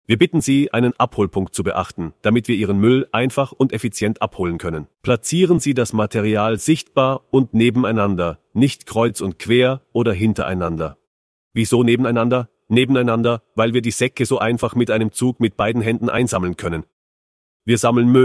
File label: audio background; Tipp Bereitstellung